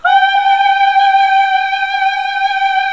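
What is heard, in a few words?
HMM G1, choir; Best Service Gigapack 1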